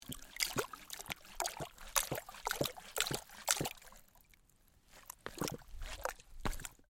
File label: standing on squelching rock